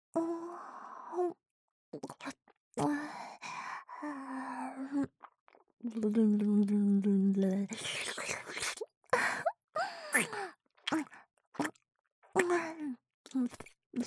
フェラリピート

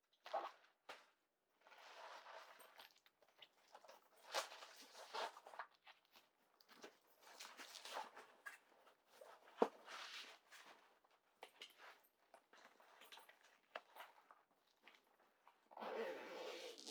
バッグ に 荷物 を 詰める

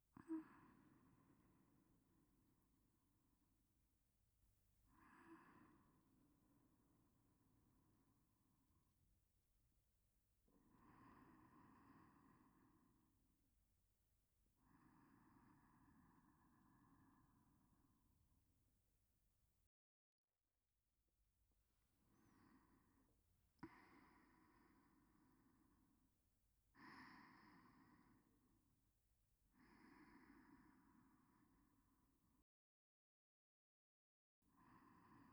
寝息 ループ

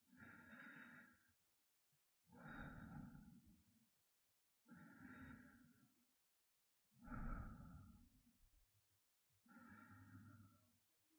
寝息トラック; vol.1 コアラ; 一緒におやすみ

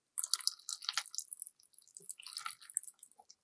くちゅ音, ASMR

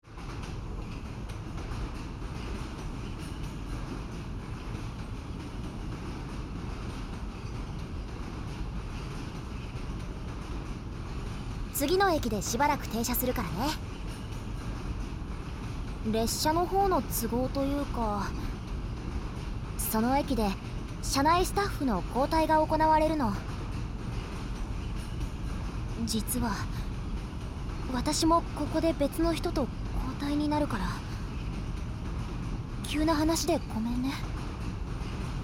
膝枕でお耳吹き＆耳かき（右耳）; いやでんっ! 〜癒やしの寝台列車「サンライズエクスプレス涼海(すずみ)」〜; 列車音・オイル

